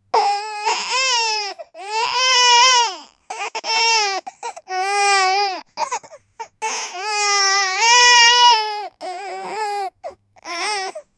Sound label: puppy crying sounds like